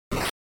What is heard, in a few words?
Noise Phrase, NES; NES-General